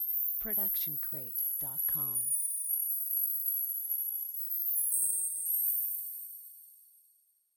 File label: fairy dust; soundscrate